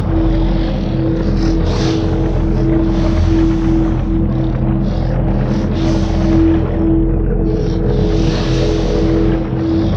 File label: golden aegis loop; LoL; jarvaniv